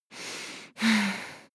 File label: breath female aroused